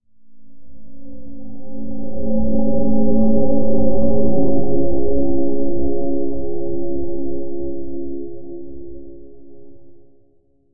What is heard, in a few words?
the wind swells slightly, gong